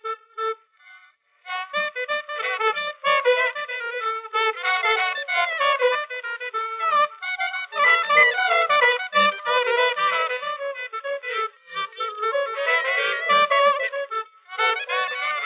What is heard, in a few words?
brass